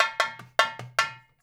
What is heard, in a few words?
FILL, Percussion; InVision Interactive